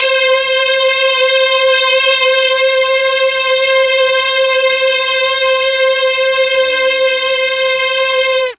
C3 16 violin, mellotron; TRON 16VLNS

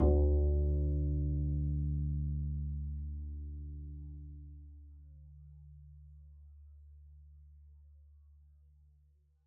pizz d2, double bass; dsmolken

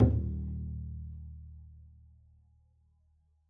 pizz eb1, double bass; dsmolken

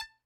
Hohner Special20 F Hand Vib rel A4, Harmonica; Aerophones; Releases; Free Aerophones

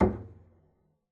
pizz noise mute d, double bass; dsmolken; noises

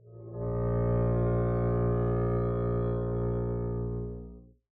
arco bb1 mf up, double bass; dsmolken

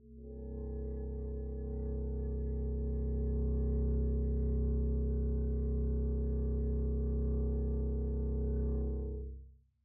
arco c1 pp down, double bass; dsmolken

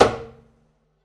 pizz noise body slap, double bass; dsmolken; noises

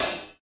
Hat Semi Open Softest; hydrogen drum samples

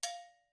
agogo Bell ff, Struck Idiophones; Agogo Bells

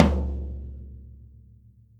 Tom Floor Hard; hydrogen drum samples

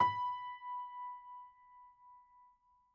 GPiano sus B4, Grand Piano, Kawai; Chordophones; Sustains

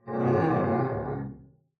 arco noise moan, double bass; dsmolken; noises

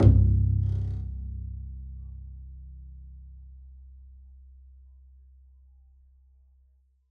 pizz c1, double bass; dsmolken

